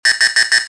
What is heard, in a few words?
alarm